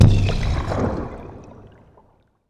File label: frog bubble destroy; weapons; dota; creep; neutral